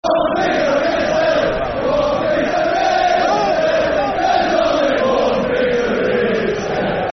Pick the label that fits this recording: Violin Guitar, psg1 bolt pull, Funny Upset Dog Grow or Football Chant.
Football Chant